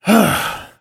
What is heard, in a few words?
sigh